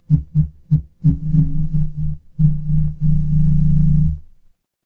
office phone vibrate

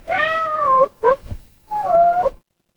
animal cat meow

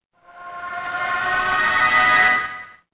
transition riser

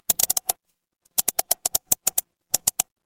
office mouse click

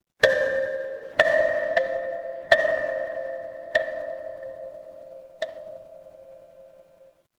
horror ambience dark

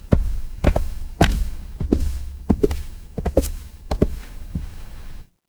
footsteps concrete

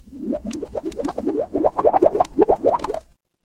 water bubble

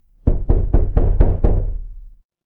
door knock

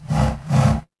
feedback error

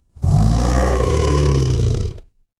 horror growl

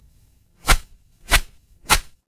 paper fabric cloth whip

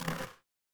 feedback error

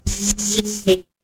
magic scifi shield

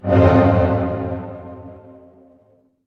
transition braam

